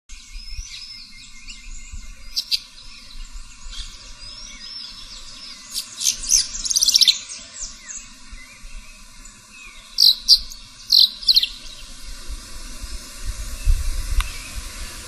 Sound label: Progne tapera; Los Picazos; Jorge La Grotteria